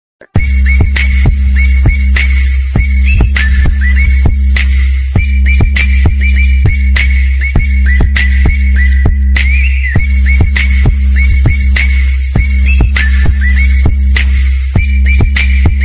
jingle bells ringtone

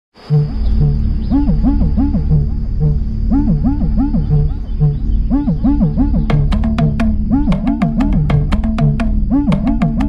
udukkai bgm high quality; naane varuven; dhanush; selvaragavan

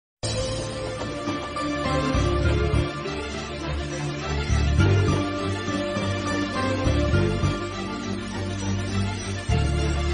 movie bgm ringtones; varsham movie

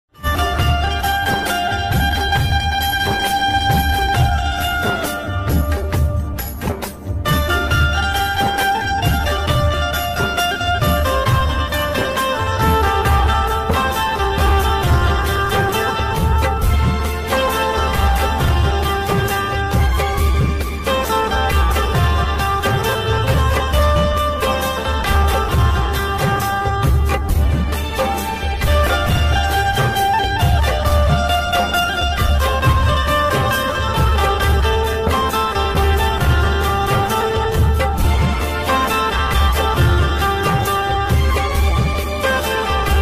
love ringtone

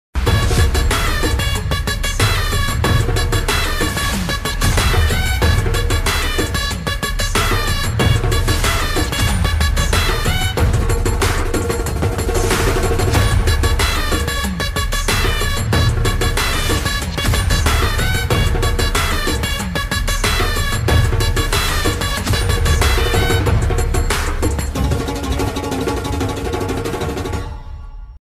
mass bgm ringtone; devil movie; kalyan ram; samyuktha menon

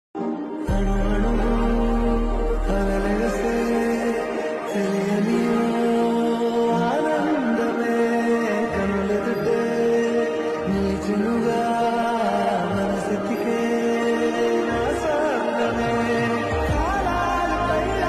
song bgm

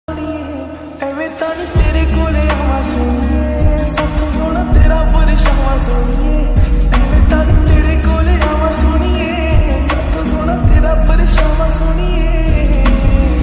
lofi ringtone

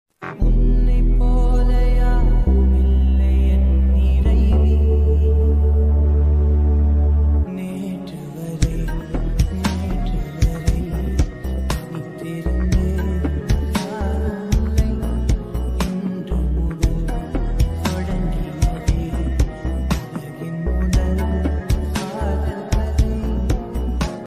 song ringtone bgm ringtone

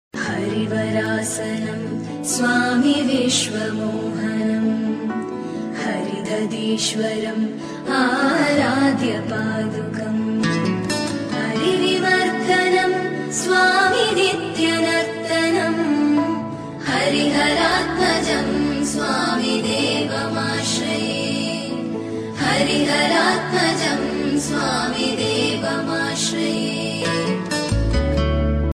ringtone song in tamil; ayyappa

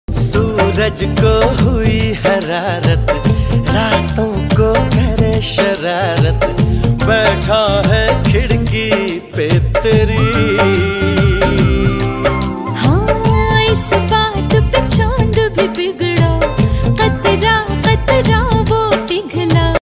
lofi slowed song

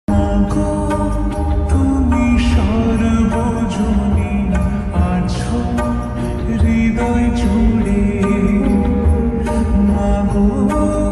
bengali; durga

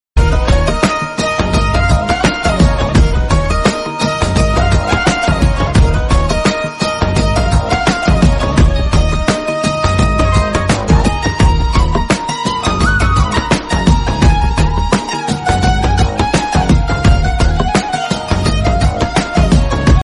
tamil love bgm ringtone; arya; trisha

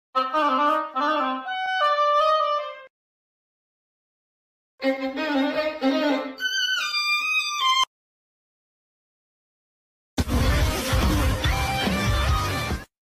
flute violin guitar; gacha meme

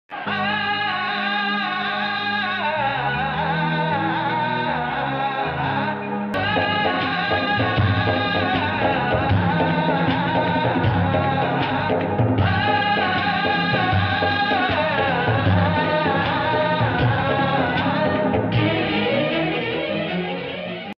mass bgm ringtone; jayam manadera; victory venkatesh

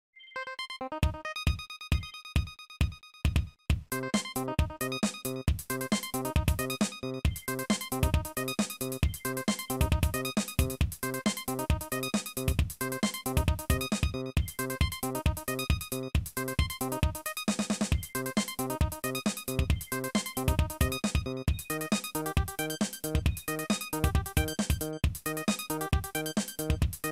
nokia ringtone disco 4 poly

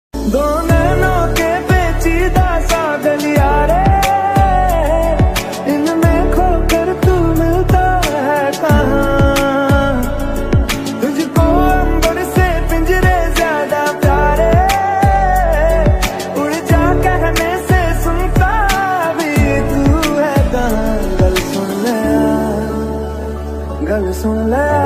ringtone trending ringtone

